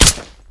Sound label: galil; weapons